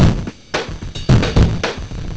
3 drums

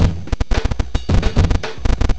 drums

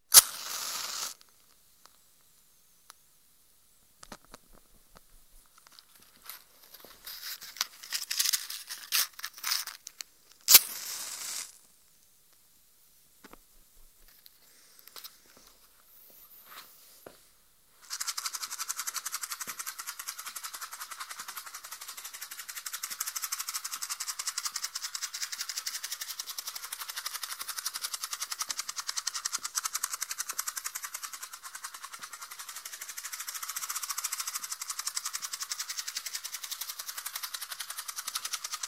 Binaural Matchbox

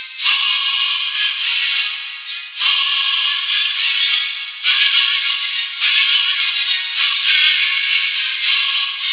without hum

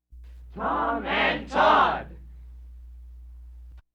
shout

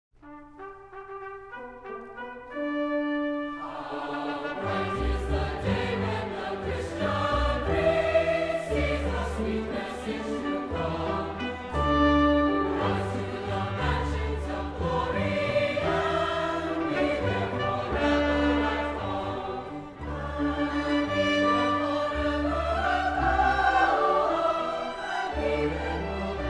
Concert Choir, Southern Hymns; MIT Concert Choir; Thomson; How Bright is the Day!

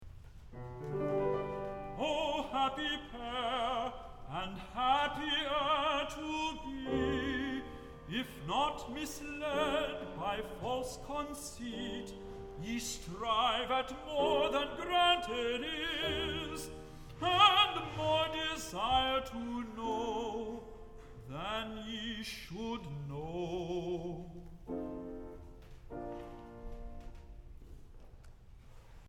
Recitative O happy pair; Uriel

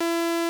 linear inter 330

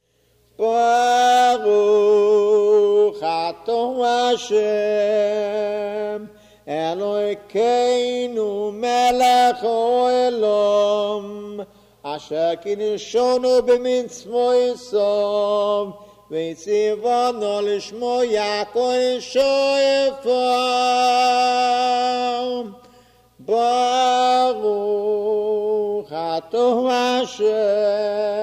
Shofar

